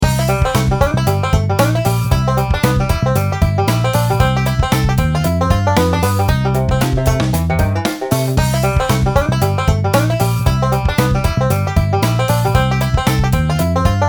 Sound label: Banjo original